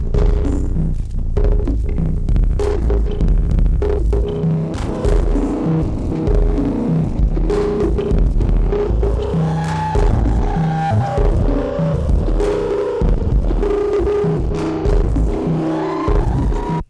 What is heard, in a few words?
sludge drums; tr08 + lyra-8